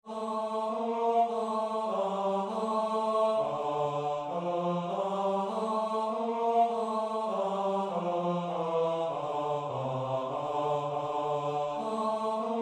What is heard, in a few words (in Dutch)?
Kyrie (Gregoriaans); Psalm 88